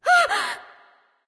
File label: surprise; smite; voicepack; hel